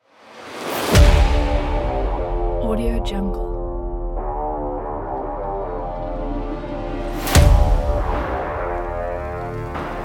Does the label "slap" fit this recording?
No